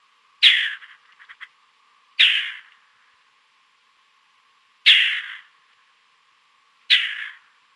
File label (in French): lerot